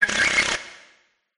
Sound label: cry